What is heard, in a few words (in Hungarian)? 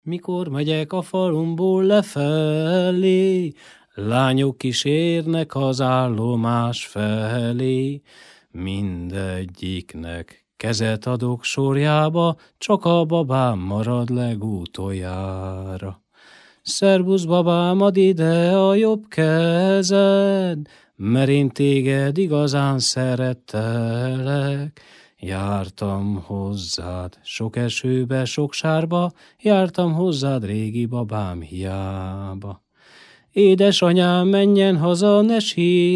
nepdal; mikor megyek a falumból lefele